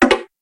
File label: Stamp; Se Stamp Hp